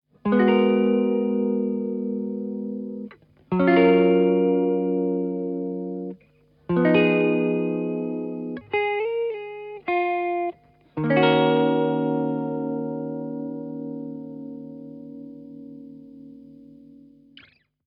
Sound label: Shaker